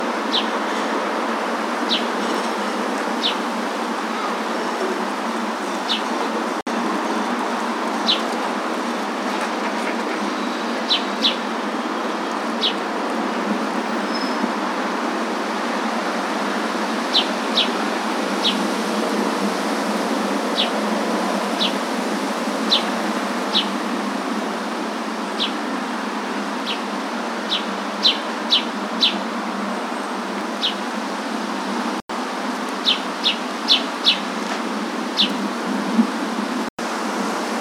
Carolina Wren, Thryothorus ludovicianus; Aves; iSoundNat

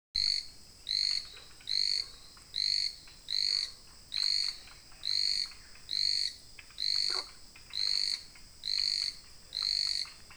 Eurasian Jackdaw, Corvus monedula; Taccola; Aves; iSoundNat; inaturalist

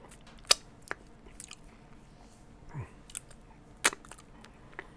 mouth sounds

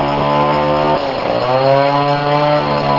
chainsaw

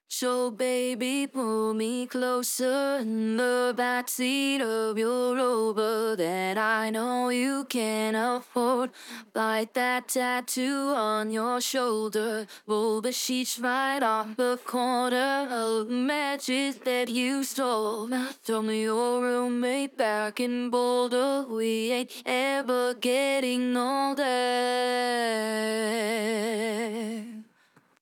Dry Voice

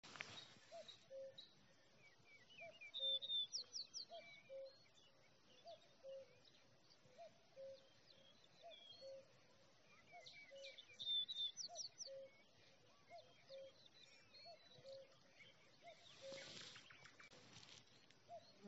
Common Chinese Tree Frog, Hyla chinensis; 中國樹蟾; Amphibia; iSoundNat; inaturalist

